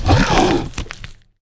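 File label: larva brown damage, monster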